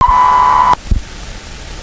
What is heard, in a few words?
broken testing, electronic; SFX